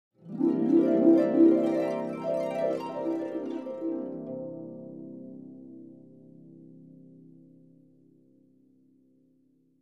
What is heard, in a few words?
Daydreaming harp sound effect